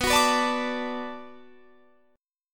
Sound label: D 6th B, chord